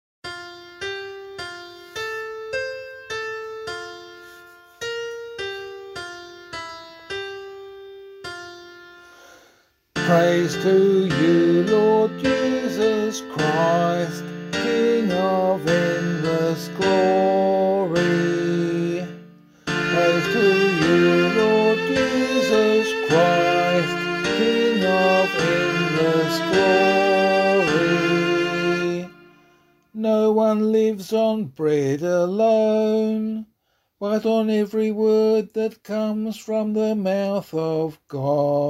vocal, Gospel Acclamation for Australian Catholic liturgy